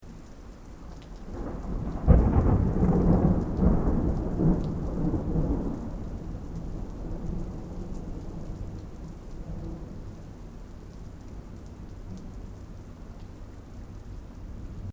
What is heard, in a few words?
THUNDER DISTANT STEREO; Elemental weather effects